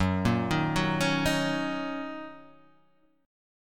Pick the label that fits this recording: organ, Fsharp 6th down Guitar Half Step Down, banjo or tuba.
Fsharp 6th down Guitar Half Step Down